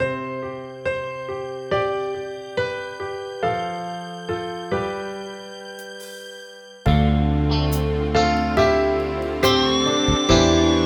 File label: Piano Ballad